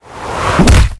flying kick; battle

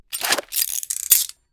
restrain; battle

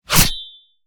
scratch; battle

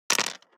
dice roll; squares

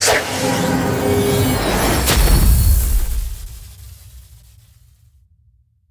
explode from the shadows; battle

